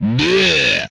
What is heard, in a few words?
Death, Vocal; retro arcade